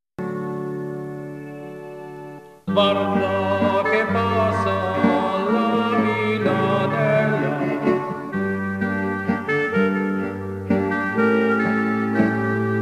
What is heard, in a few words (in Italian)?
Vilanela